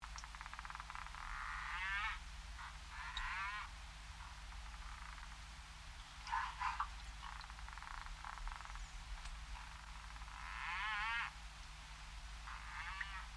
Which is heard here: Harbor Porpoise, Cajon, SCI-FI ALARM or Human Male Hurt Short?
Harbor Porpoise